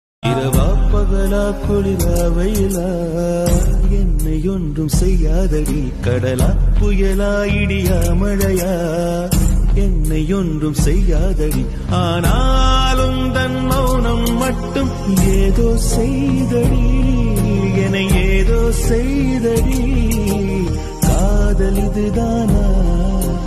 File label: tamil love song